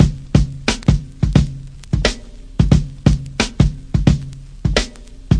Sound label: 88 bpm, groove, drum loops and breaks; wait for it